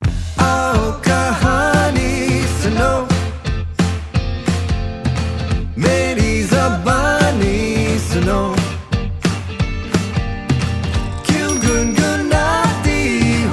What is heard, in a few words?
hindi ringtone